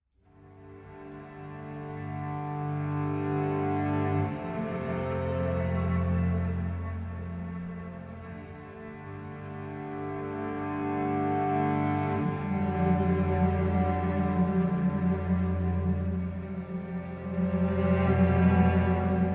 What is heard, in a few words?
Weep Horn